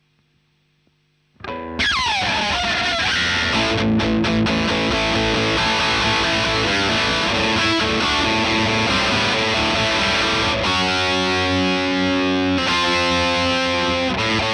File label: Marshal Gtr